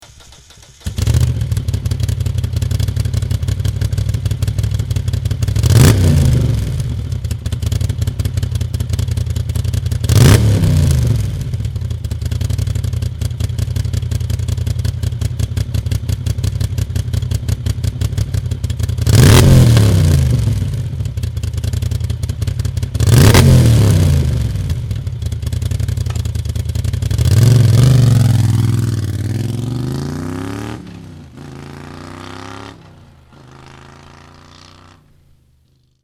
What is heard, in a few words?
guzzi start